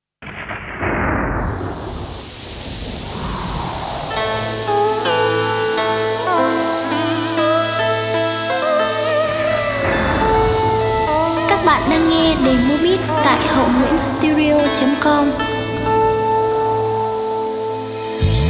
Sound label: Lk beat, playback